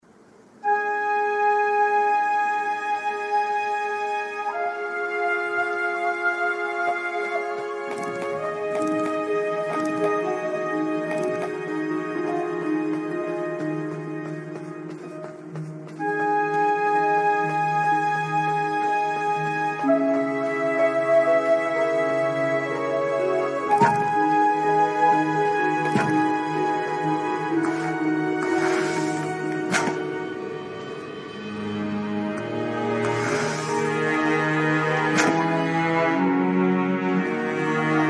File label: music starting level; Titan Souls